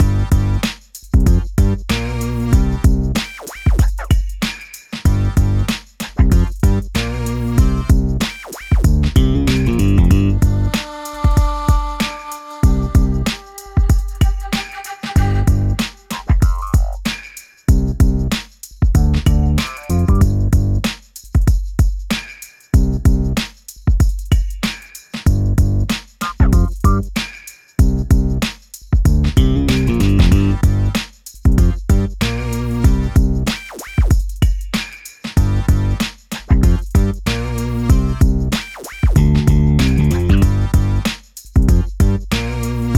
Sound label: BGM hiphop